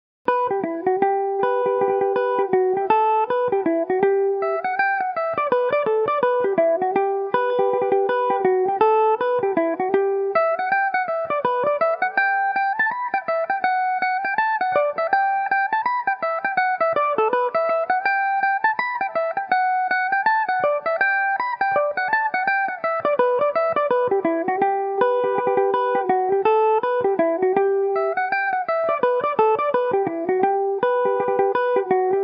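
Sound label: E dorian revelation, Irish mandolin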